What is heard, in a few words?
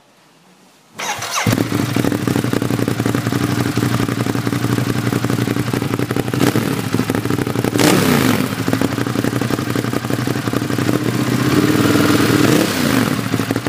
monster 821